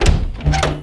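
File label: wood open; Mafie 1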